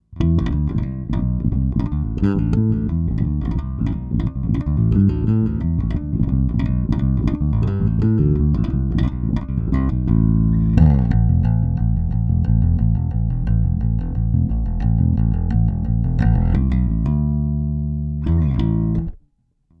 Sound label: Both active midboost consolidated